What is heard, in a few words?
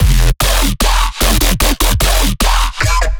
bass loop 150 E; Virtual Riot